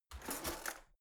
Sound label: old drawer out half hard